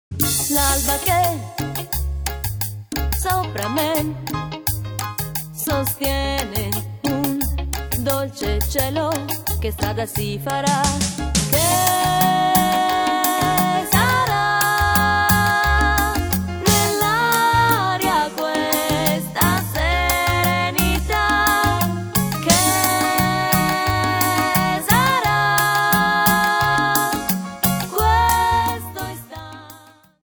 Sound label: cumbia